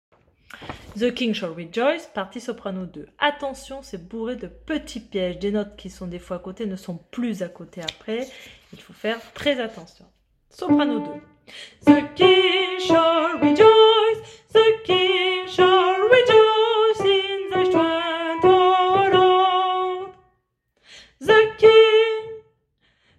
soprano

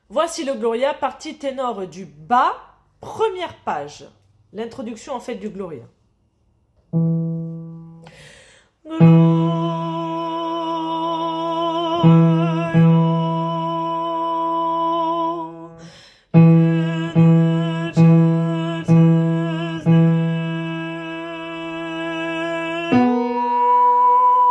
Gloria intro tenor bas

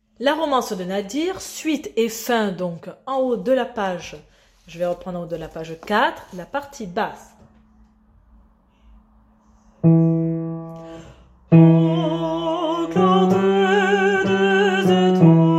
basse; Romance suite et fin

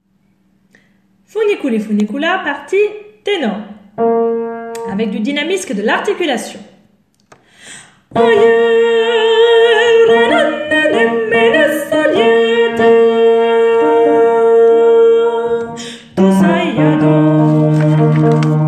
ténor; Funiculi

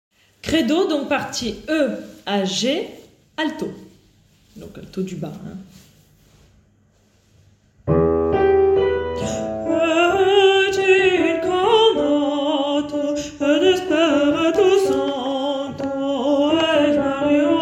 partie alto; Credo E à G

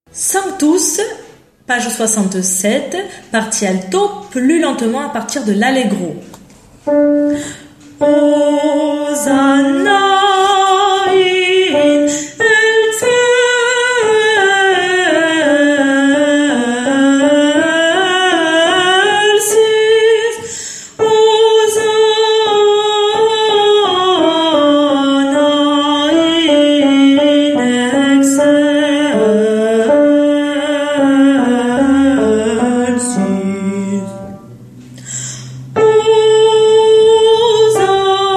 Alto lent; Requiem; Sanctus; Mozart

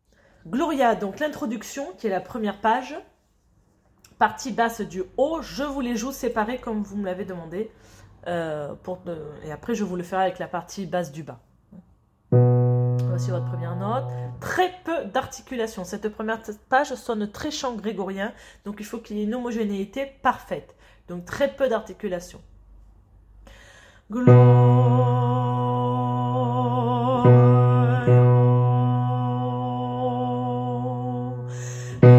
basse haut; Gloria intro

